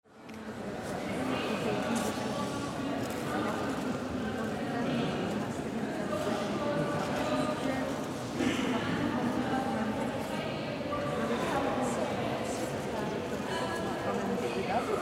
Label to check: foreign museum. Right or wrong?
Right